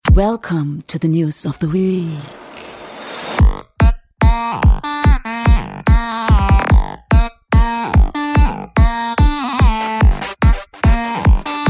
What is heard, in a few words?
track ringtone